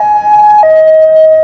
hilo, sirens

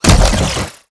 Drone Missile Attack Start; blue archive

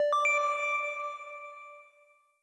Granted; blue archive

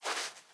Sand Foot Step; blue archive

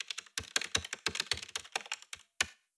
Typing; blue archive

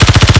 Drone Vulcan Attacking; blue archive

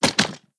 Drop Gun; blue archive

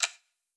Clock; blue archive

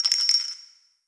Bell; blue archive

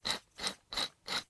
Screw; blue archive

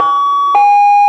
hilo, sirens; code3 finnish

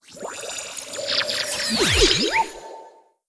Gacha Normal; blue archive

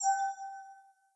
Node Rotate; blue archive

